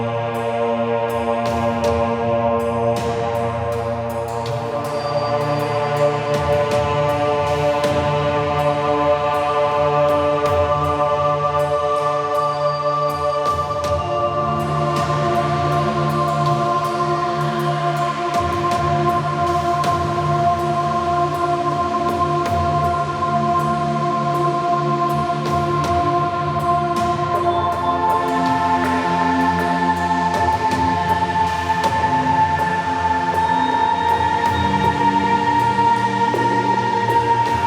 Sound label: Cinematic Music; Cinematics; Railjack Built Cinematic Music